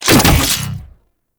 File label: Mech Start, Weapons; Warframe; Corpus; Tenet Quanta